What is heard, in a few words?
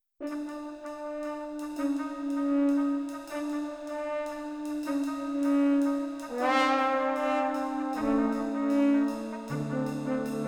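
brass quintet; к/ф Золотая мина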